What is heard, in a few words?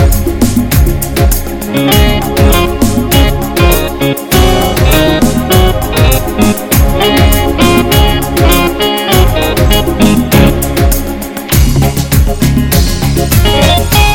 Gentle Touch